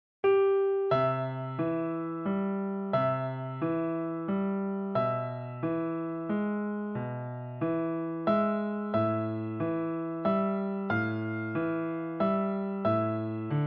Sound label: easy piano; franz liszt; liebestraum 3